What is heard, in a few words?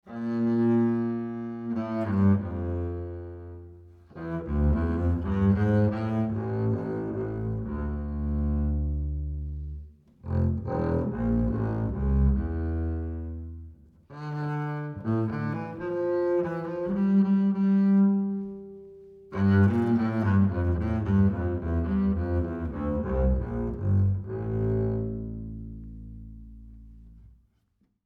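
acoustic bass Bowed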